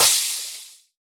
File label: Crash